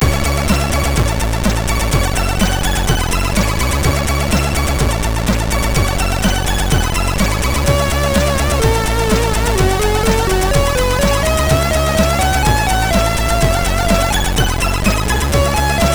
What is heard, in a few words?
No Cruel, MOS6581R3; sid